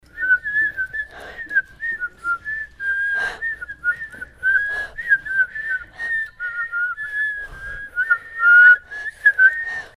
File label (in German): kinder pfeifen